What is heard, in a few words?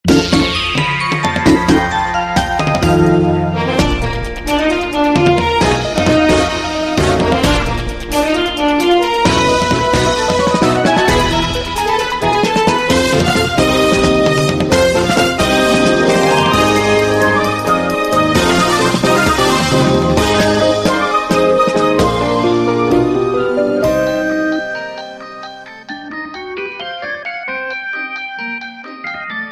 Title Screen